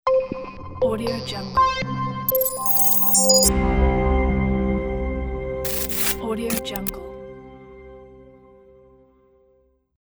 tech logo

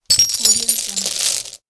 chain clinking

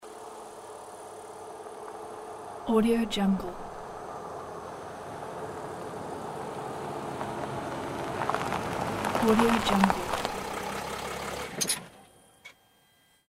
diesel engine car approaches stops and engine off

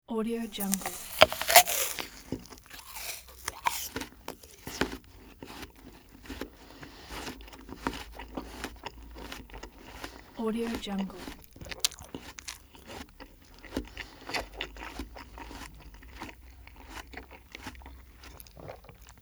eating an apple

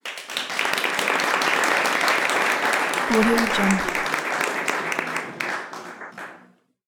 indoor crowd applause; debsound

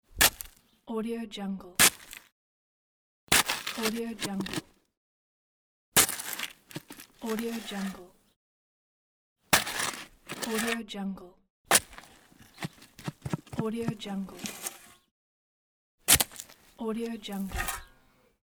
digging the ground

